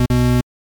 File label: wrong